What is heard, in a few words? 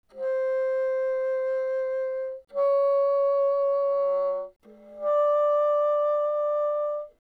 Bassoon pp C5 D5